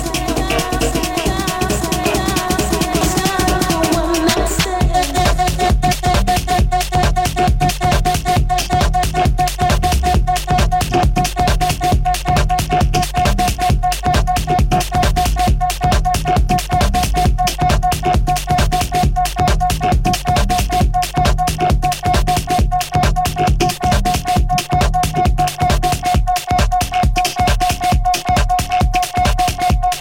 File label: vocoder club mix